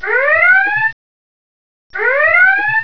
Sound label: alert